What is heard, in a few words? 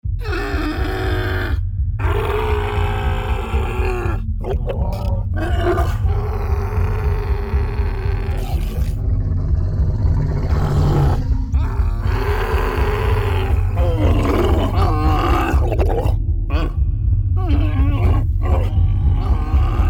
Camel Growl Vocalizations